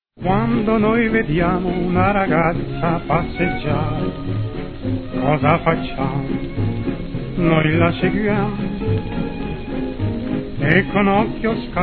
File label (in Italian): TRIO VOCALE